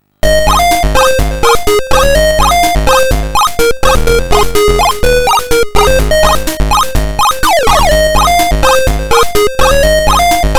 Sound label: victory; Bubble Dizzy; Atari ST